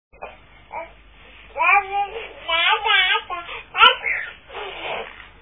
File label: babble; January 26: Babble